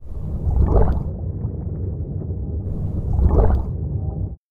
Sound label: swordfish